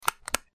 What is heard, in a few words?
token select